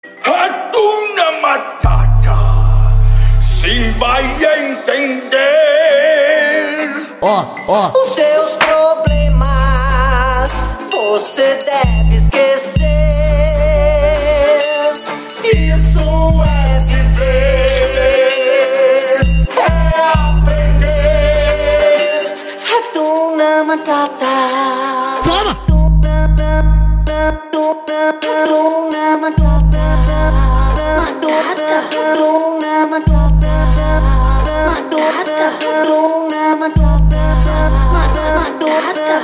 Funk; toque; Timão & Pumba

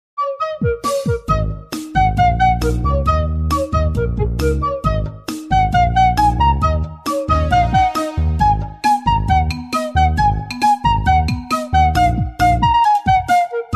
Flauta; Toothless Dancing

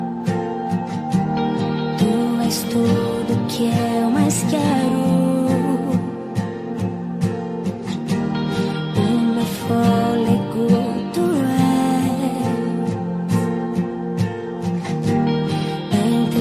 Gospel Palco

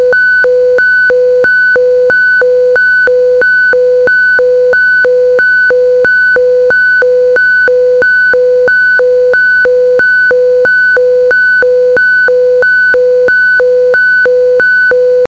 Alarme de Submarino

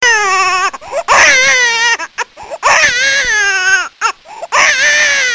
Choro de bebê

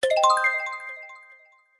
Android Whatsapp Notificação

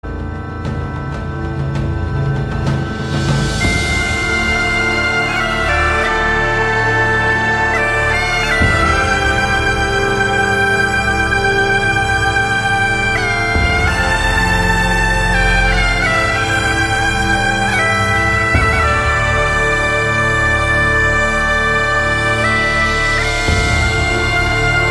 Cover Gaita de Fole; O Último dos Moicanos

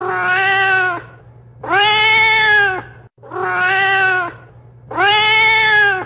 Gato Bravo Miando

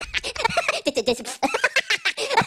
Morrendo de Rir

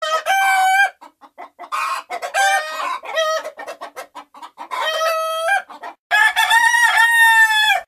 Galo Manhã

